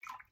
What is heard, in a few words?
water